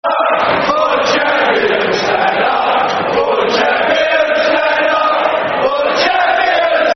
Football Chants (Soccer Song); Chelsea Cfc